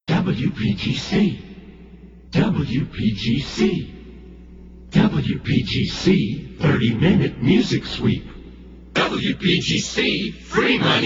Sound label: jingles whisper chants; 1980